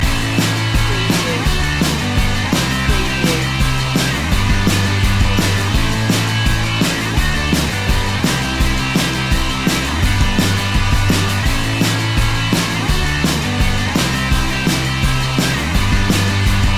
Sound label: indie party loop